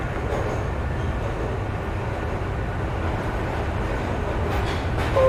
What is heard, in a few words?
train; hl2